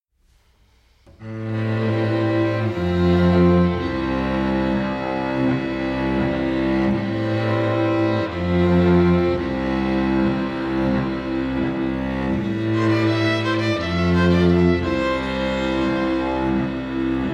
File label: String Quartet; Manchester String Quartet; Many Strings Quartet